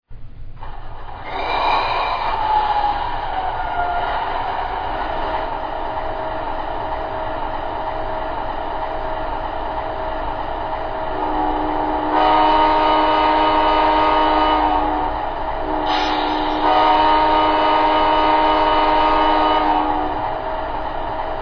genesis; amt

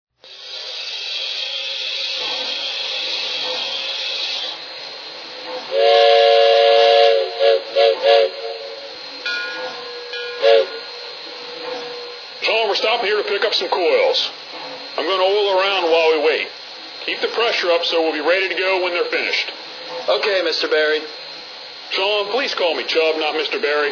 460, trains

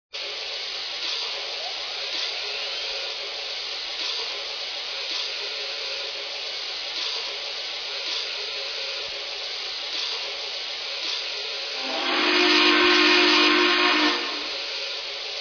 mikado, trains; MTR

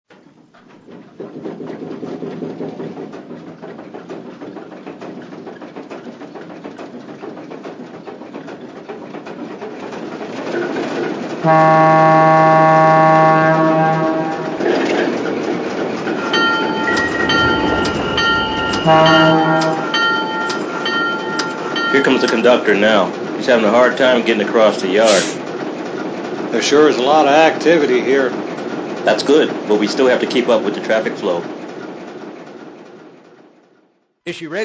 Alco S-2

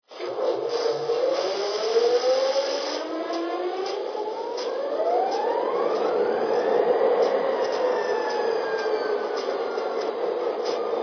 sd45

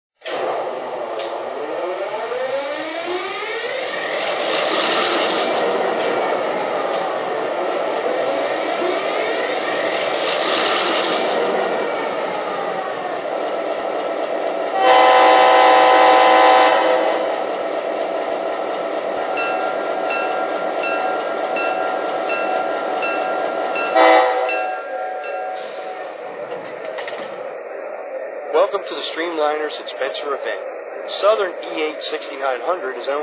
e8, trains

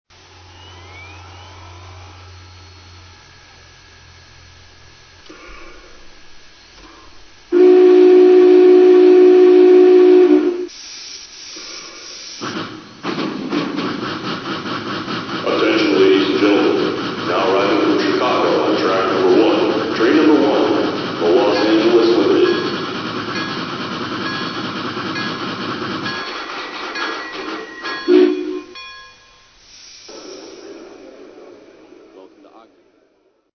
BIG BOY 4010

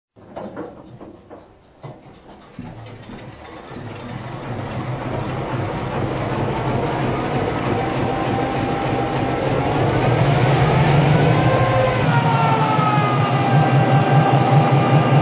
GP-9

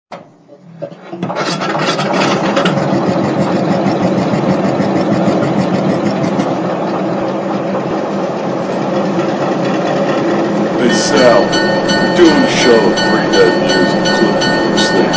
GE 44Ton

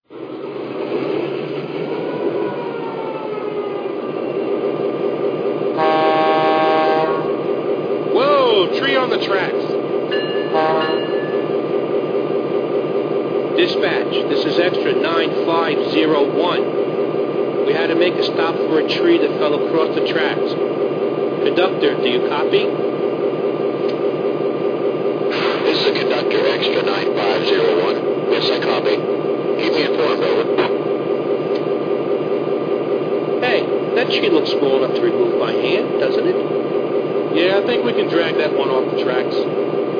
f3 aba

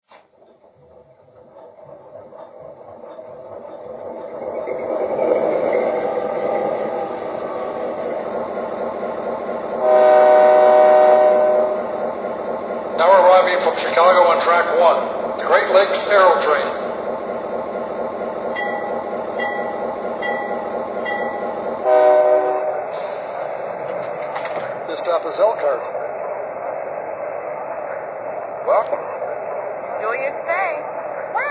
aerotrn; nyc

